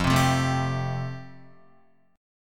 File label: F Major Add 9th down, Fadd9 chord, Guitar; strummed; JGuitar